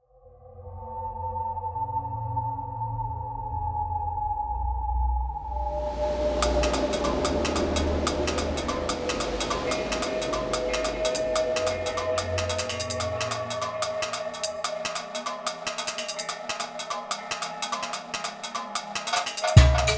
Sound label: Warrior Groove; Anger Music Library